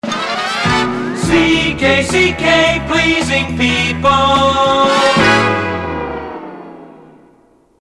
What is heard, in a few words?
Gliss Power; CKCK; PAMS